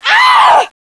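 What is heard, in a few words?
die, alien controller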